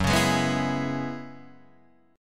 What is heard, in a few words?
Gb 9th Suspended 4th Gb, Guitar Drop D